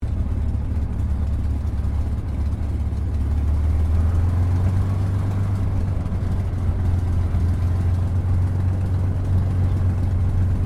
AIRCRAFT CAC CA-18 MUSTANG MK23 TAXI SHUTDOWN INTERIOR; CA-18 /P-51 Mustang; Airplanes; Vehicles; Aircraft Collection; Twistedtracks